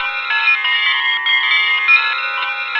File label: WARM BELLS; WARMMW.BELLS; CMP - Analogon